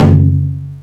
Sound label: menuhit; Taiko AC0 v4